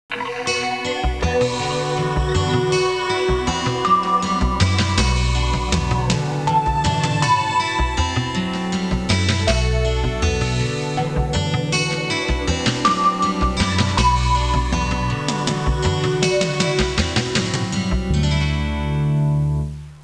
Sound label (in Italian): musica elettronica